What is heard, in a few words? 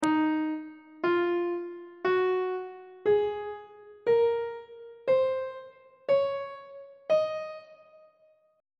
e flat dorian mode; on treble clef